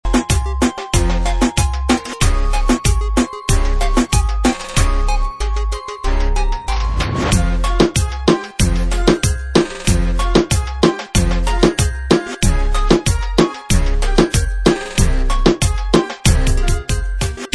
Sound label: Reggaeton Beat